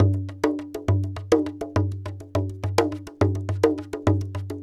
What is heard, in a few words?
AFRICAN TALKING DRUM, LIVE ACOUSTIC PERCUSSION; Sonic Foundry (Sony Creative Software); Vir Unis Infusion